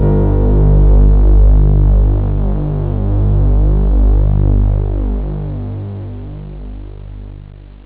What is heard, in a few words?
hi synth